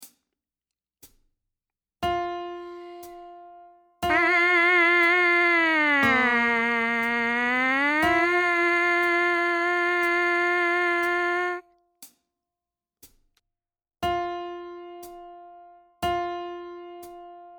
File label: Mpc buzz, trumpet